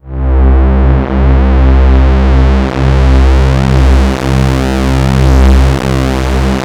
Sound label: MX-610 C3, string; Best Service Dream Experience